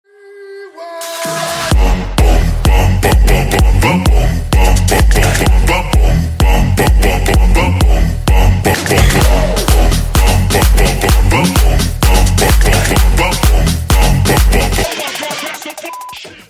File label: boom ringtone remix